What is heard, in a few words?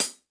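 hi hat acoustic closed inner, Roland TD 7; DRUM MACHINES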